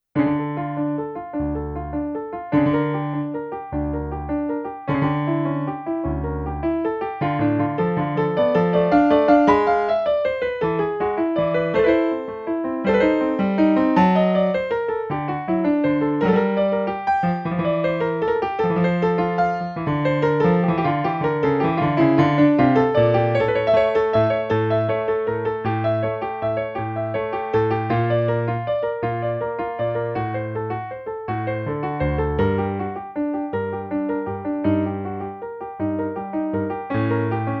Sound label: bach prelude in d minor; bwv 926